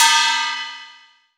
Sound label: Asian Gong; Cymbals